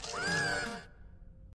death scream

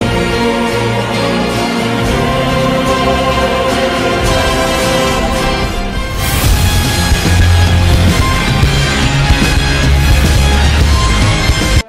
epic; war thunder

